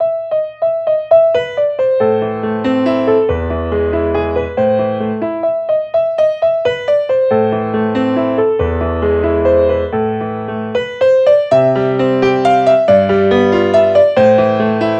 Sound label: Piano